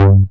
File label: PPG BASS G2; E-MU Formula 4000 Series Vol. 2